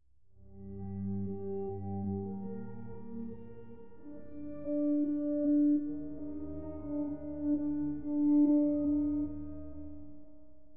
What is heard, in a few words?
classica